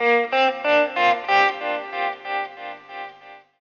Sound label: ELEC GUIT ETHNO 125, Guitar; eJay